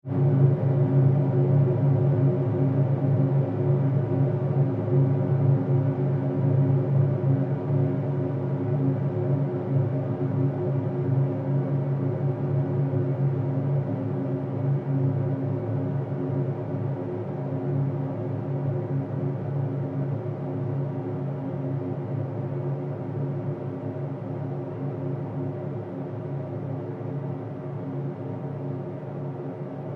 pulse fade long, Various sweeps, pulses and drones; Rise And Dive Sound Effects